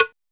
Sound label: DRUMU COWBL; Drums In Your FACE!